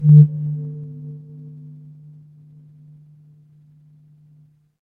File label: fire electric hum